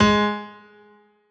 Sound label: G Sharp Note